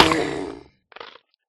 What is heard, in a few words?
death, turtle; mob; Minecraft